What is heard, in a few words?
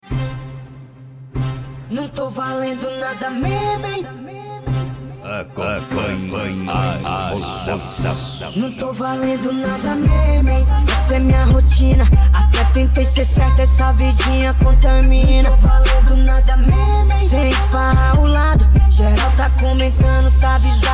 hip hop